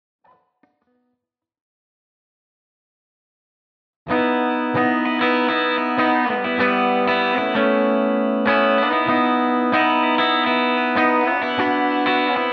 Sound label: open chords example